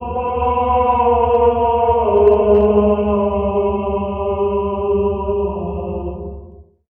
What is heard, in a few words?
voice benedictine; Sad